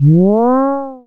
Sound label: C#, Synclav FM, FX; Big Fish Audio